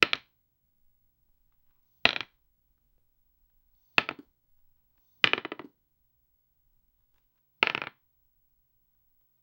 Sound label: poker card drop stylized